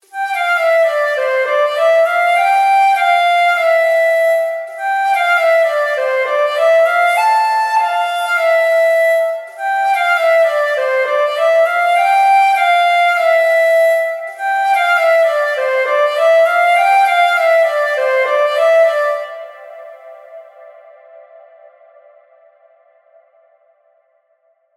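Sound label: Flute & Clarinet Melody - A minor 100BPM; Construction Kits; STEMS; Ghosthack; SkyRealm; Kit 10 - Windhelm Forest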